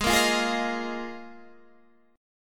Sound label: A sharp 7th Suspended 4th G sharp, Guitar